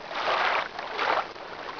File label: outdoor